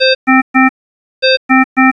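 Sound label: power high, alarms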